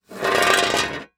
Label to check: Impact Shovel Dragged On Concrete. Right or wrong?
Right